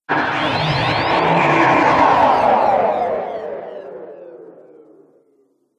Spaceship Hyper Strange Space, spacecraft sound effects; Twistedtracks